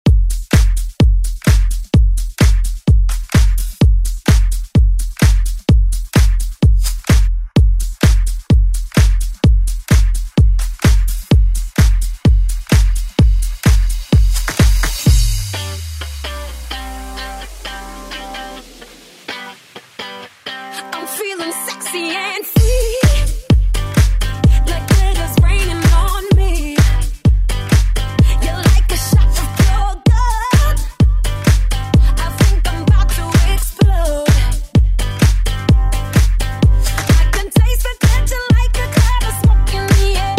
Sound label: Pop Redrum snippets; Deejay Hit